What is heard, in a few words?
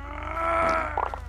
Grunt